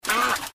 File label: obstacle flight